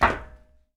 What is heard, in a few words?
run metal; Escape from Tarkov